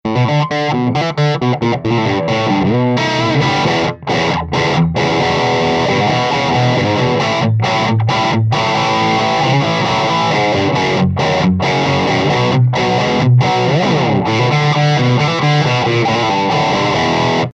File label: Collings Hollowbody; Dual Terror PPC410C